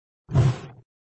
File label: Sound Dull woosh (movement), flipper; Dull woosh (movement) v1